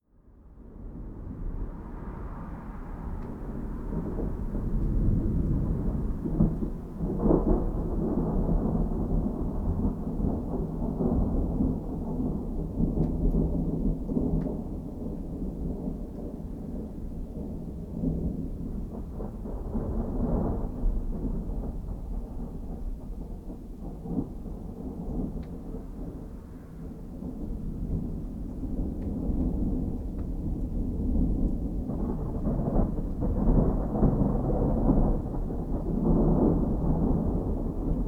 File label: ambience